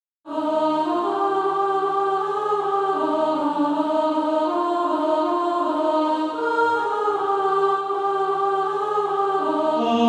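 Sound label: alto, Practise Music; Darling Nelly Gray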